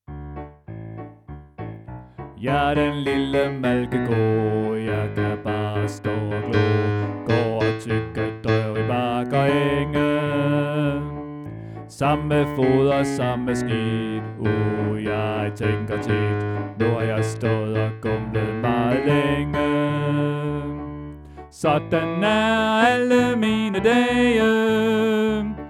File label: Bas